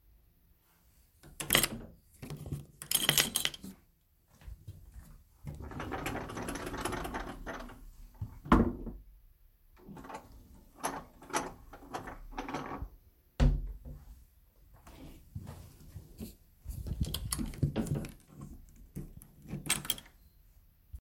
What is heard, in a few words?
Hall cupboard being opened